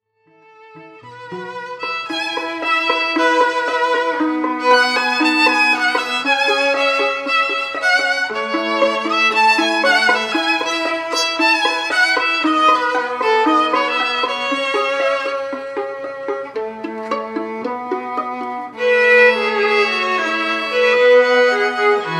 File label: Classical Ensemble